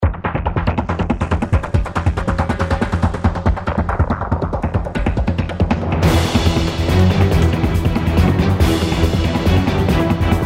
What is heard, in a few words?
dramatic chase; Poptropica